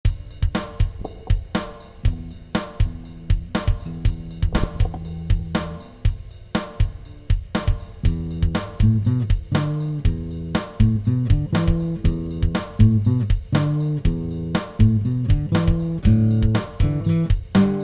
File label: Instrumentalist